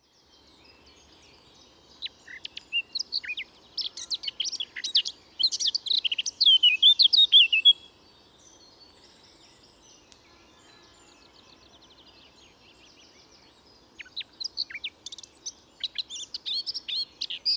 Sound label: Sylvia atricapilla; SETE